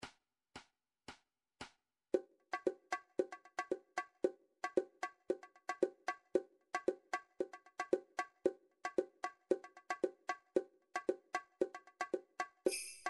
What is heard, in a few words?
drum trax per